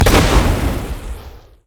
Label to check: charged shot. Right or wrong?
Right